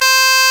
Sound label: ScotBagpipes C3; NorthStar - Global Instruments VOL-1